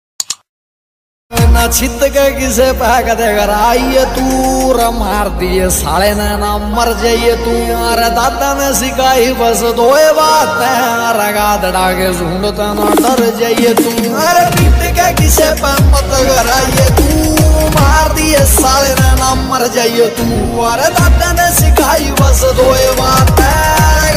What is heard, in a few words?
haryanavi ringtone